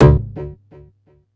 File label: Bass Hit, Synth Bass; Sonic Foundry (Sony Creative Software); Groove Elements